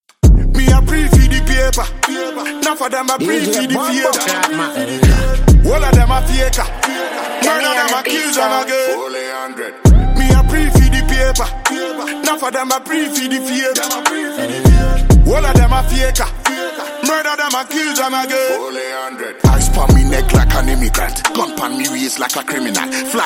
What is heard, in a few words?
Riddim Freestyle Cover